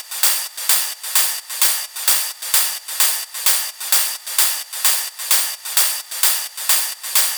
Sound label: Ride Loop 130, Techno; Techno Sounds 2024; Rides